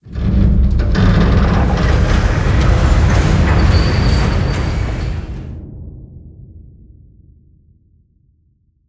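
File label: Floor Elevator Gears Start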